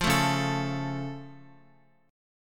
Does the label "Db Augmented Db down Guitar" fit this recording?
Yes